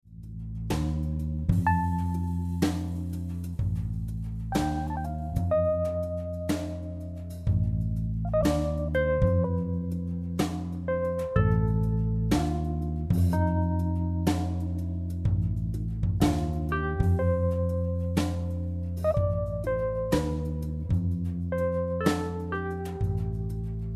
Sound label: Asthenic Gong